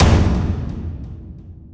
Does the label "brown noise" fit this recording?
No